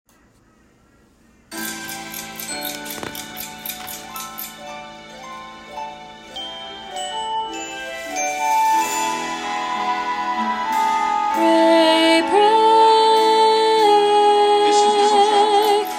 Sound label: Alto; Fame The Musical - Holiday Pack